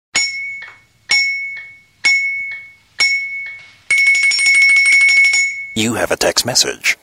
Crazy Bottle Sms

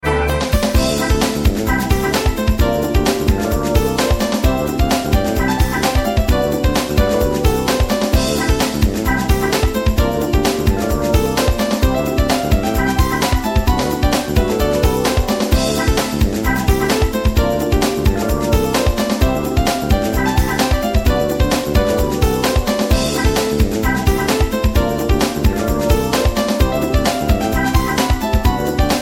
Sharp Eyes Piano

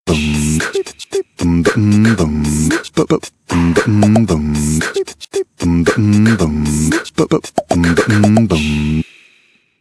Beat Box

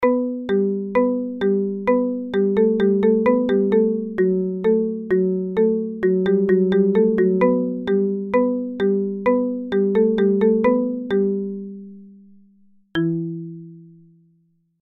Marimba

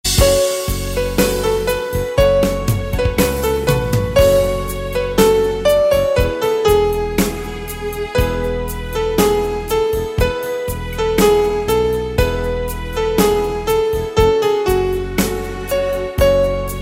Instrument

